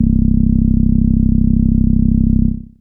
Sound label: Bass Synths hits and octaves